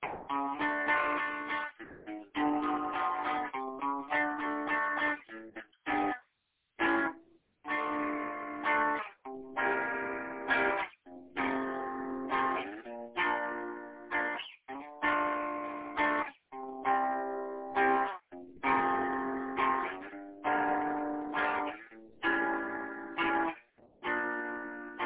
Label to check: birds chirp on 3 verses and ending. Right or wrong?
Right